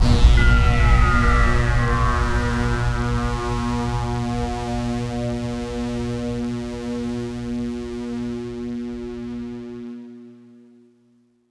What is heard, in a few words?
Cutscene Stinger Generic; zombie army 4 dead war ps4 stadia switch windows xbox one gamerip 2020